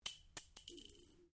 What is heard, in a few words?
Cartridge 22 Caliber Long Impact Ceramic Tiles Stereo; shell casings of different calibers impacting on different surfaces